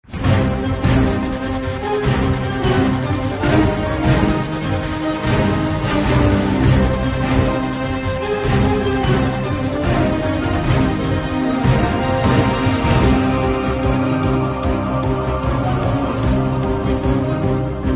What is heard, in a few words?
action bomb